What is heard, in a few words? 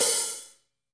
HH hh; Best Service Gigapack 2